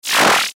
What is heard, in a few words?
Chime Transition Multimedia; Web Interactive; Chimes; Technology; Blastwave